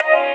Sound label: Eb STAB, funky